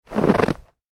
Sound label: human footstep snow; Single Footstep On Snow; McKinney Sound